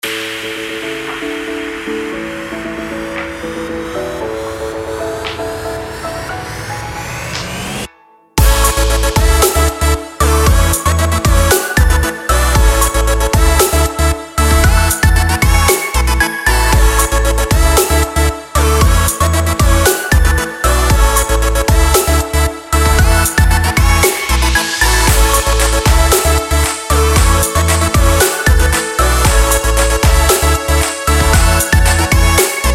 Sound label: Happy Bass